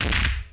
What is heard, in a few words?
Punch; RedDot